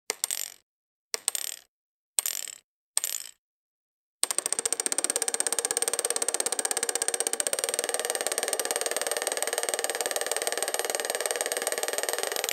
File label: sound of a spinning roule, gambling